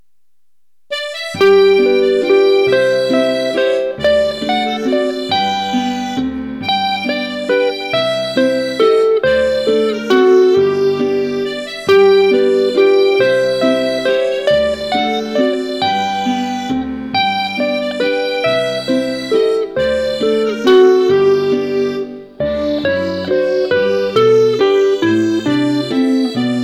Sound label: waltz